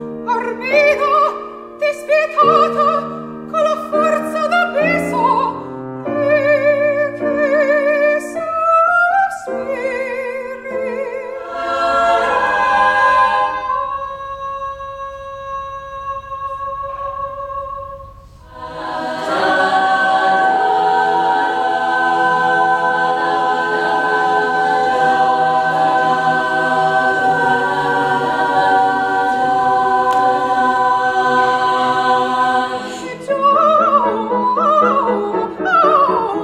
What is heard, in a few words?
Soprano